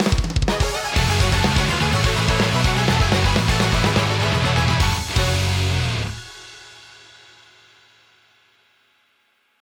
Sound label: Win Jingle